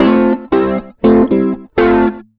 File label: rock, acoustic guitar loops